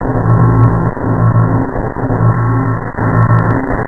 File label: Noise; alone in the dark 4